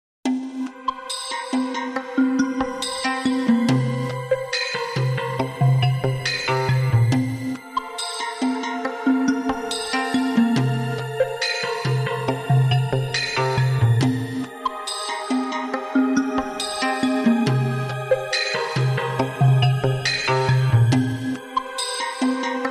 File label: Disjointed Machine Like Mysterious